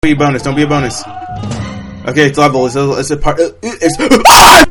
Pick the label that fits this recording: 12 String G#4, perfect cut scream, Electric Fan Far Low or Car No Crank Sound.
perfect cut scream